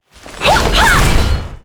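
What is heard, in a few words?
attack, monster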